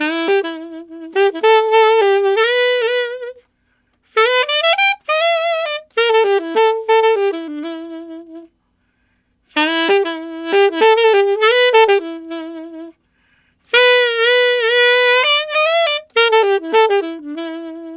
Alto Rock in E7, Alto Sax; Sonic Foundry (Sony Creative Software)

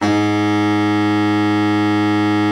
BARITON, Baritone sax; Best Service; Killer Horns

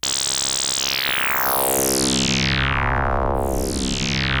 Moog C2; Hollywood Edge; Giorgio Moroder Rare Synthesizer Collection; MLTI.MOOG

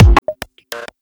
Glitch Corrupted

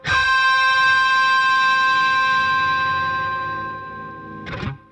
Funk Guitar; 90 s Sample CDs; Zero G

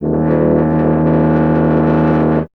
BRS TUBA, Solo Instruments; Miroslav Vitous - Solo Instruments VOL-2

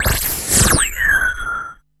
SYNTH FX

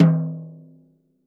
rack tom - snares off; JazzFunkKit

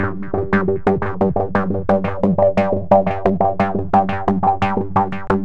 philter (seq); Sonic Foundry (Sony Creative Software); Ugly Remnants VOL-2

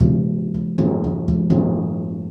BIG TIN, rhythm loops; Rarefaction - Poke in The Ear With A Sharp Stick

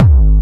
hardbassdrumz, breakcore; Bazzdrumz

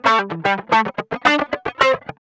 GUI, LICKS; 110 LICKS

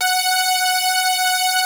POLY SYNTH; Wizoo - Powered Wave

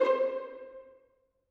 violin piz C5, Strings; M8; BBC

